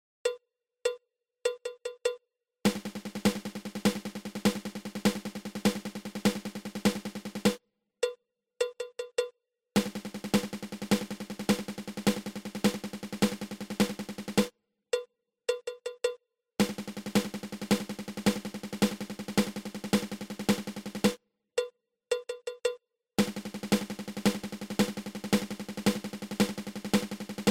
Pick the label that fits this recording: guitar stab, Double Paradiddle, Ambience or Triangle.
Double Paradiddle